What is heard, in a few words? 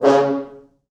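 French Horns staccato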